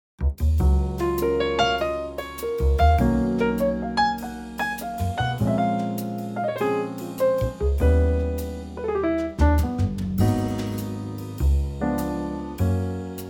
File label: Gb Trio; key - Gb - vocal range - Gb to Ab; Wonderful Trio arrangement of this classic standard, very lightly swinging, with some subtle chord chang